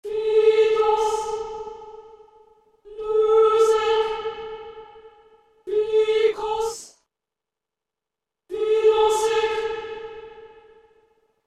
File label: Female Chant; Soundtrack; Final Fantasy 8